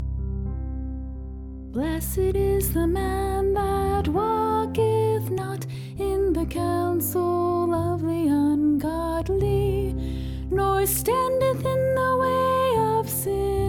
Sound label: Psalm song